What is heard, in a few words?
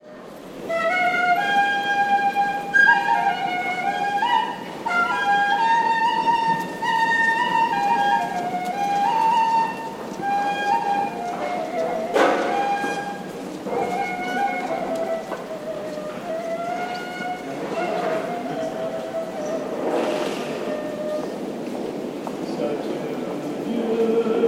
ney and vox şişli mecidiyeköy